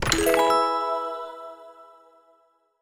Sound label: Calendar Milestone Dialog Box Claim; Clawee